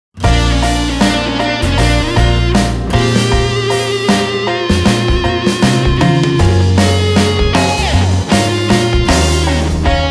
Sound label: Tweet Twoo, Nokia Tones